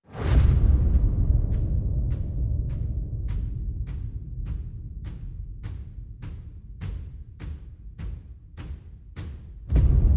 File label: Perc and FX